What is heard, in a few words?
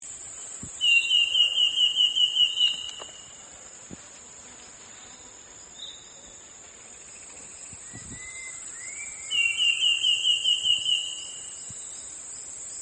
yellow-throated cuckoo